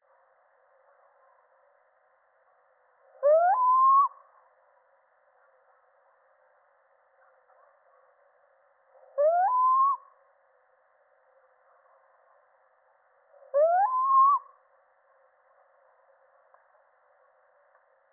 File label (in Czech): Sycek obecny samec